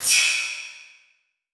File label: Transition Hit Impact Ping; Adobe; Production Element